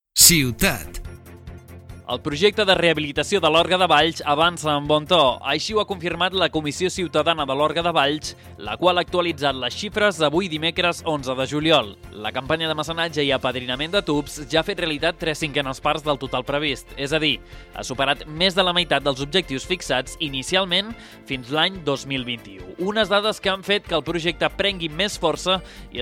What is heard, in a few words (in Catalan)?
Orgue